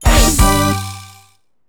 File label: SE RESULT RANKUP; maimai